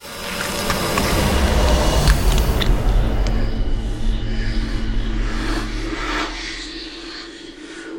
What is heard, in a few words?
open wounds; weapons; dota; lifestealer